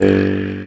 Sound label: MT G, JHARP G B; Xsample VOL-9